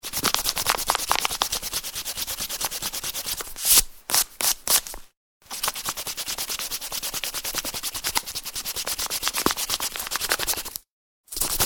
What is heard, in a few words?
Rubbing out on paper with an eraser; Erasers (Sound Effects:Offices)